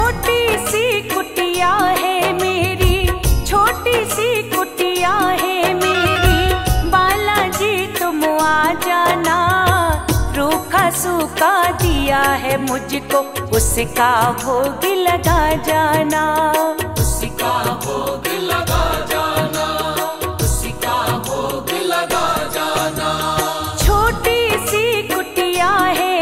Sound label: Bhajan Ringtone; Bala Ji